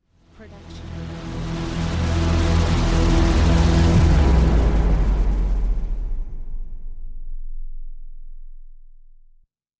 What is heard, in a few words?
trailer riser; soundscrate